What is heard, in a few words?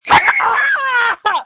screams